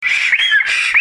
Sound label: bird call